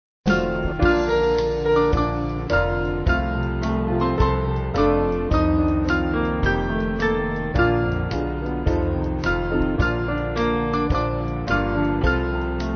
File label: Band Quieter; Materna